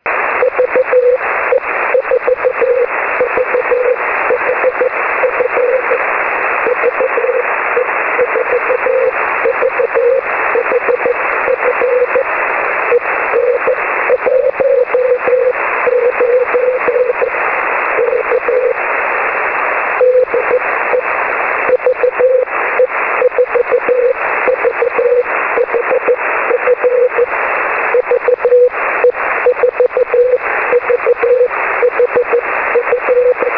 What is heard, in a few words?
6m, VE4VHF beacon on auroral Es; 6 Meter; N1BUG